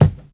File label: clear steel kick drum sample g key